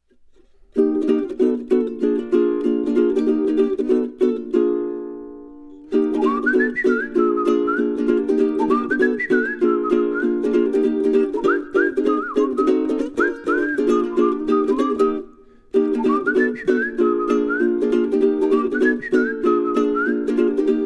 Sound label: Ukelele